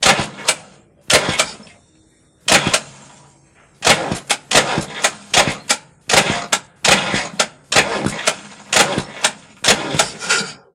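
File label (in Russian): zvuki startera avtomobilia, car starter sounds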